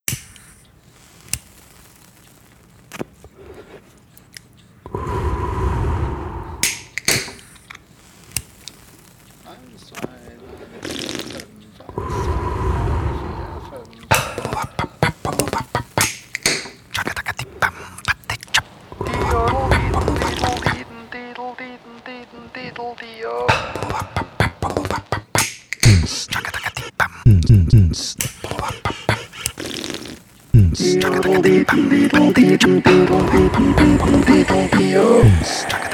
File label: VOCAL